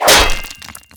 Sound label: arrow impact metal; weapons